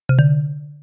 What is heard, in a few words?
text send; Sound design for the opera composer: concepts and methods; Vicky and Albert audio cues